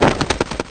flap; alita

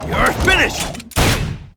gun crit

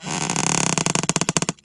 bend; oscar

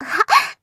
giggle

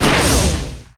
bullet hand blast loop end

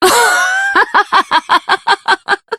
laugh

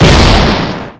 train damage; paynetrain

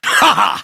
haha; hazama

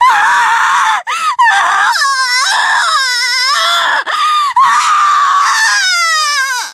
cry; erika

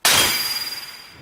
glass break

